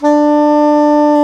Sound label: SAX SOP MF D, Soprano Sh